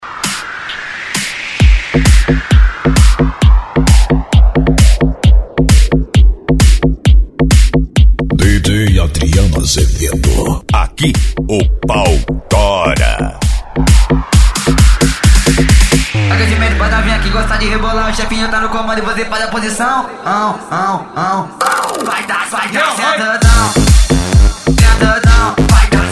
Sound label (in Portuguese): eletro funk e sertanejo